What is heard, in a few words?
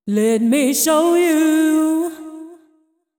deep house female, 125, A, vocals